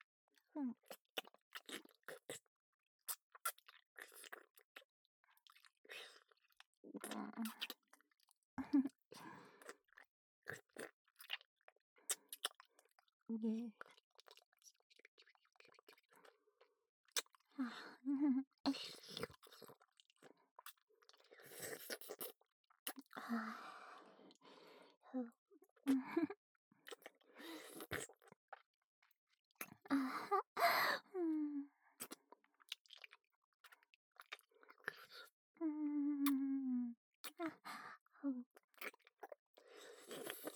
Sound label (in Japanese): 乳首舐め, ASMR; アドリブ集